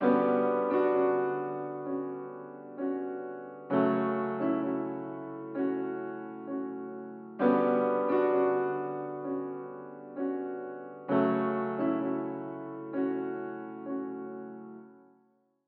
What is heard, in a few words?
ELECTRO ROCK PIANO FULL